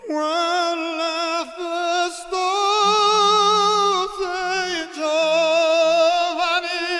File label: Rough